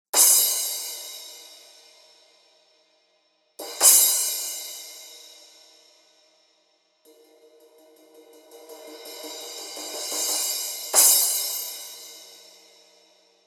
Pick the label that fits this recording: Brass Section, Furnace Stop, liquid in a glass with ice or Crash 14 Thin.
Crash 14 Thin